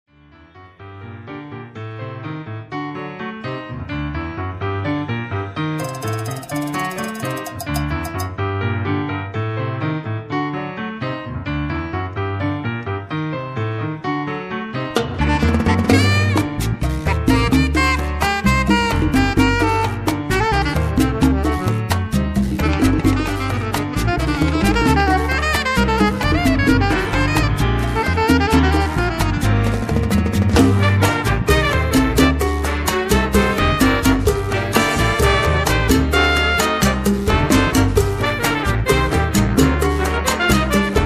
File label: Latin Jazz; Putumayo World Music